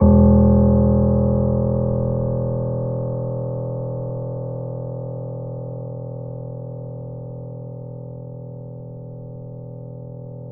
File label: Grand Piano E0 p